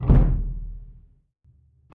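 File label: Music Rituel Migration Perc 120; from dust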